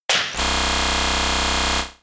Horn Drill

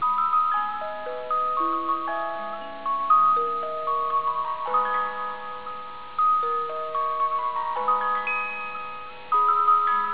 Music Box; Five Nights at Freddy's; Ultimate Custom Night; Glitch Minigame (Music Box 3)

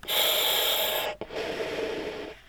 Gasmask; In the Line of Duty Firefighter

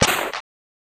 Whip

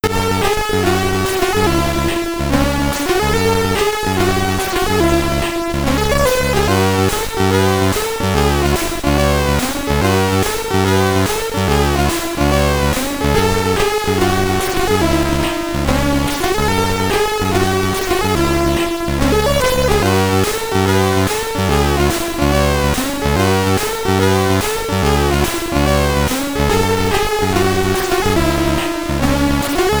Game Over (SID Stereo), commodore 64; Scary Monsters

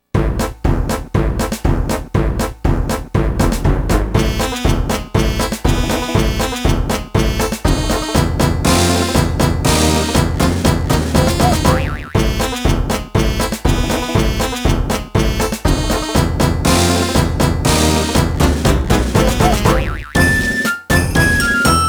Foolish Polka; atelier lina the alchemist of strahl original soundtrack

